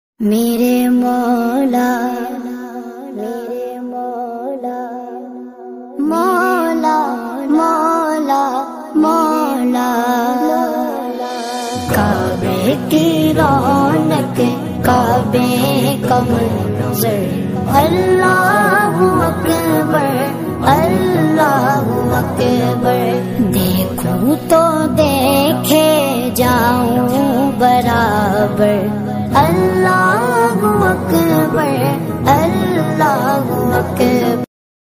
islamic ringtones

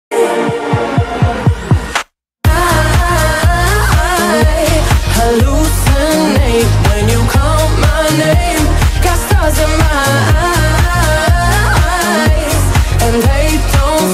english ringtone

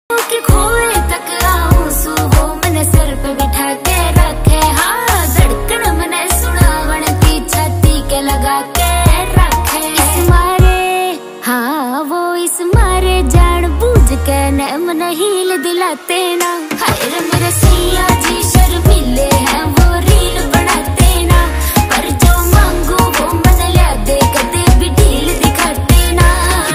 haryanvi ringtone

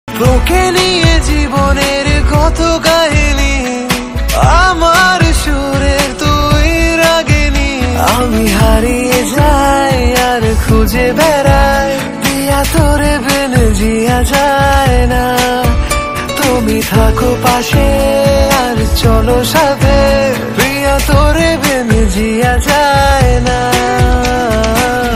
bengali ringtone

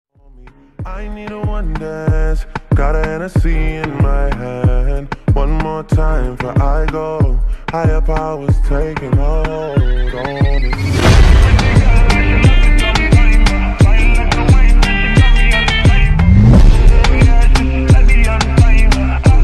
slowed reverb ringtone, english

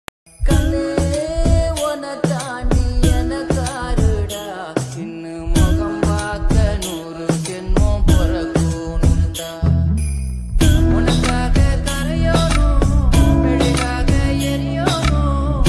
tamil ringtone

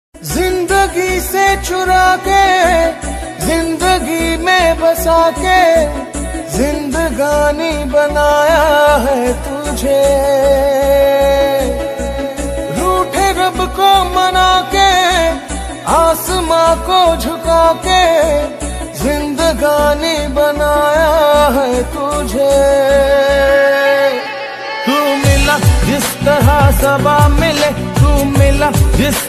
hindi ringtone

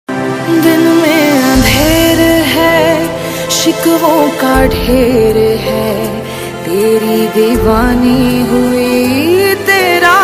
pakistani ringtone

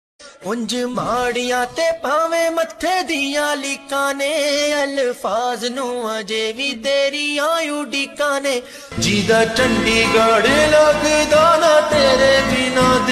lofi punjabi ringtone

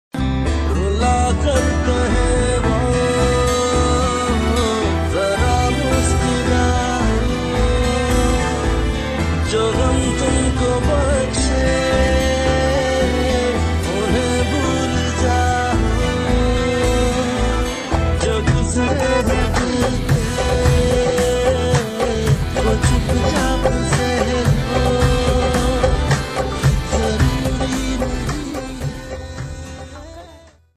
pakistani ringtone

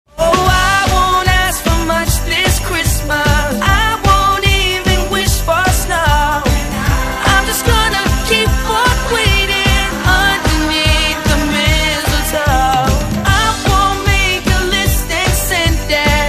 Christmas ringtone

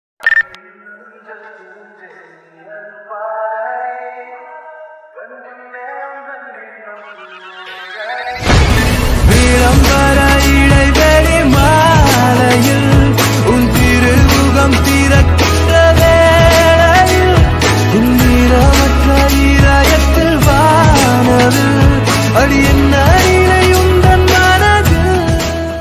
lofi remix ringtone, tamil ringtones

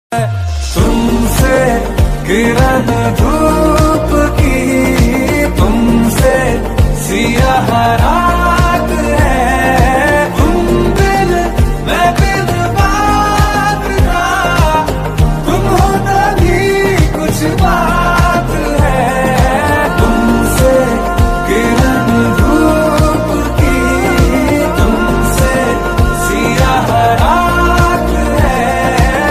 hindi ringtone

